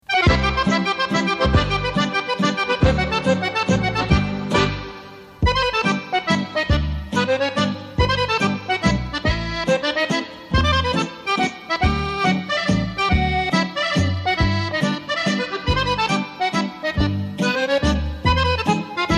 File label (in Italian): Mazurka, Fisa